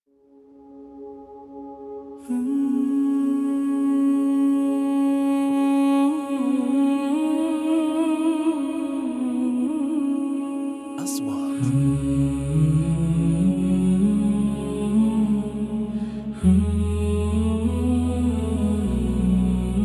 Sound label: vocals